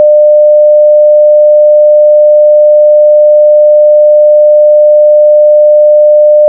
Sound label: morse